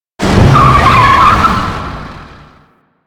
APC Move Stop; Enemies; Lasrian; Warframe

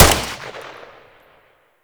Hyena Bullet Gun Fire, Enemies; Warframe

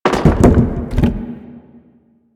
debris metal wood; Warframe; Ambience; Entrati

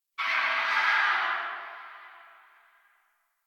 Tree Distant Critters Angry, Ambience; Warframe; Duviri

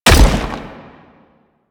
Step; Warframe; Sentients; Erra; Foley

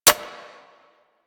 Magnum Mech 2D, Weapons; Warframe; Tenno

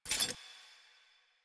Idle Sword Drop; Arthur Hub; Warframe; NineteenNinetyNine

